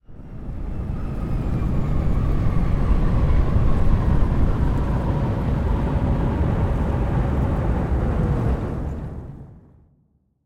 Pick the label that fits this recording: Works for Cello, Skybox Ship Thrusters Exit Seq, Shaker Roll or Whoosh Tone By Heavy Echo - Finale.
Skybox Ship Thrusters Exit Seq